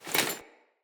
Foley Action Default Melee Swipe, Default Clothing; Player